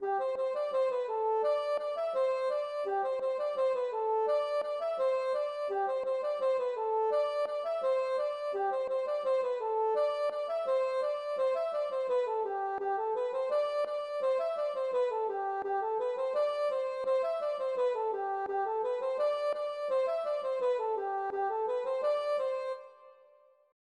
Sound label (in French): ridee